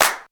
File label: hand clap d sharp key, Royality free clap single hit tuned to the D# note. Loudest frequency: 4047Hz; percussion; single hits one shots